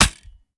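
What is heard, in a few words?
reload empty mag in, shotgun; gmod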